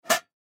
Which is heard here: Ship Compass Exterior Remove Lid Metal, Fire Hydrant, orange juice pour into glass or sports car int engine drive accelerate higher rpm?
Ship Compass Exterior Remove Lid Metal